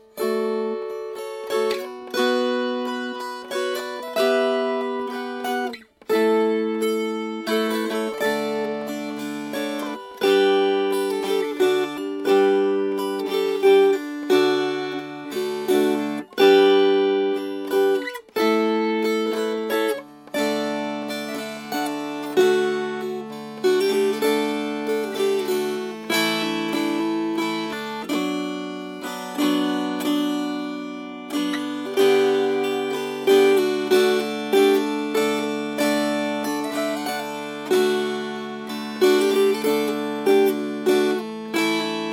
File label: Strummed